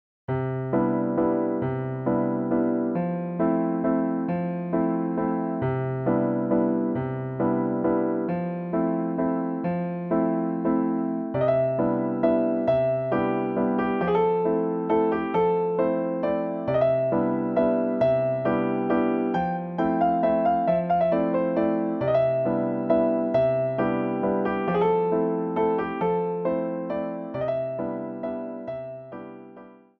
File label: Waltz; Noas Waltz